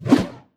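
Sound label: swing blunt, one hand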